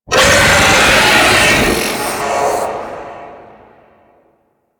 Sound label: Vocal Screech; Warframe; The New War; Archon; Boreal